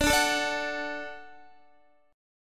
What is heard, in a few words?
D-Major, D, Guitar, 4F, 4A, 5C, 5G, 5C, 6E; strummed; JGuitar